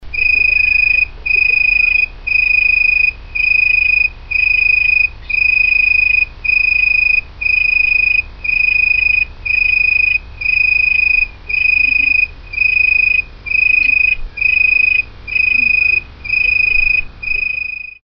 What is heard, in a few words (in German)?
Grille